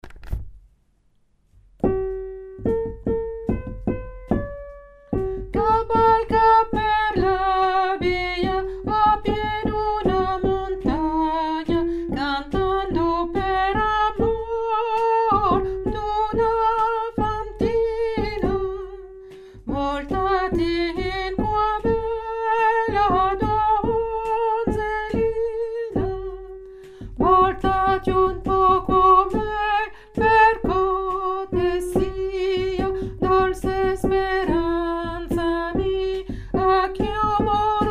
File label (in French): soprano, chorale